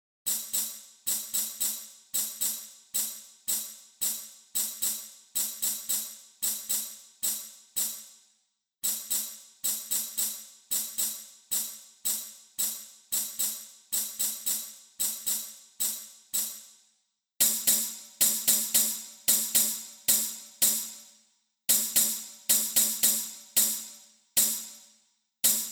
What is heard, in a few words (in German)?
Czardas Tamburin